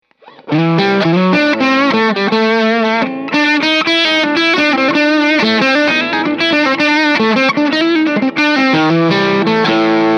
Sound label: Noodling